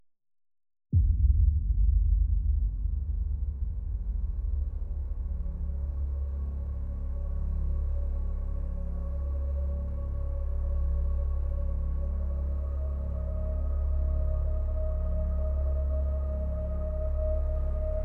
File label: instrumental version; immediate music; america the beautiful